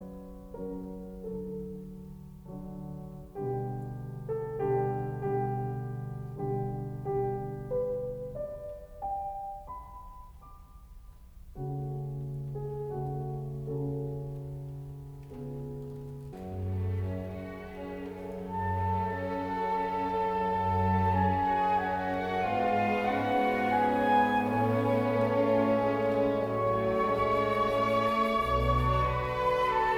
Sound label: Adagio (piano in A); Mozart; K 488